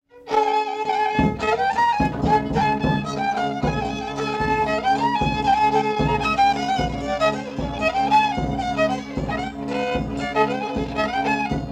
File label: oberek